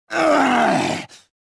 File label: combat; reborn